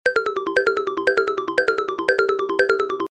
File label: roulette